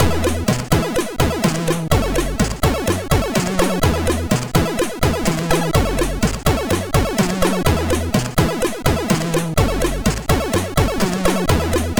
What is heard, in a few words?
sid MOS6581R2; Maerkelig 02